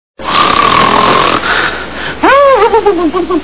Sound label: snore; Three Stooges